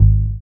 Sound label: Elc Bass; Equipped Music